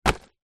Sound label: cracker box packet set down table